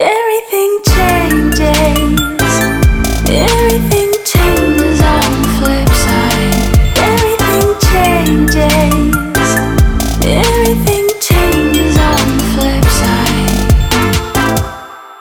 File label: trap